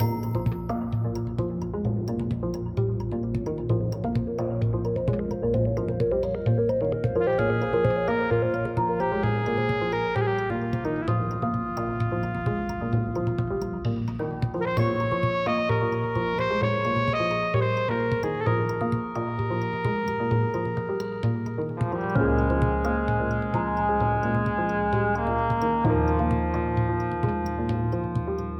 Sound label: rhythm loop; soundtracks; vikings